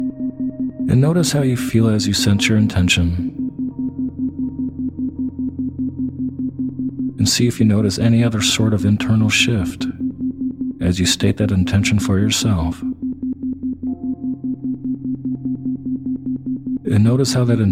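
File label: Enhanced Learning, Isochronic Tones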